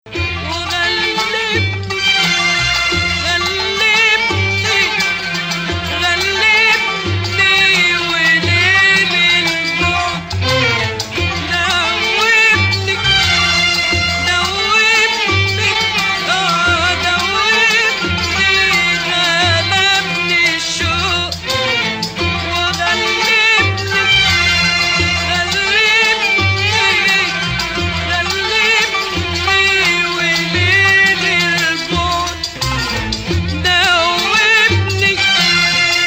Ajam, maqam

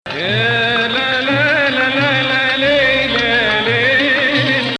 Rast, maqam

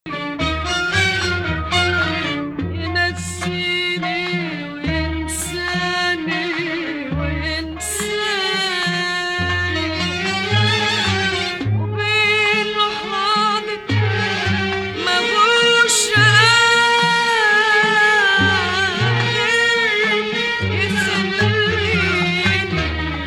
Rast Bayati

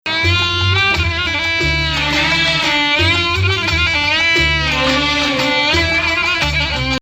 Bayati Nahawand, maqam

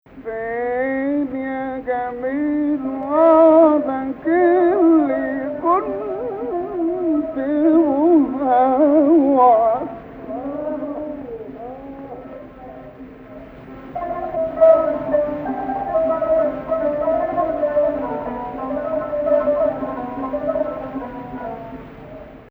Rast Rast Octave

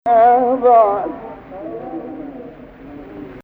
2nd Rast, maqam

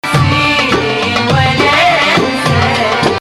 Rast, maqam

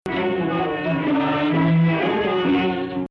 Rast (Sikah emphasis), maqam

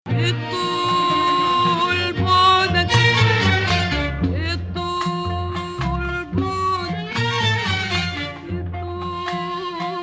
S-B 5th, maqam; Rast

